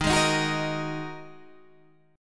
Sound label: Ab 7th Suspended 2nd Eb